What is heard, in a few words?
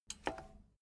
bmx bike pedal foot hit soft